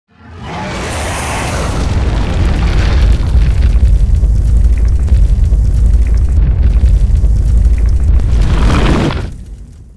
macropyre; dota; jakiro

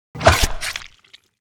arc whoosh; weapons; dota; pudge

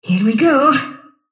sylph move pain; dota; dark willow

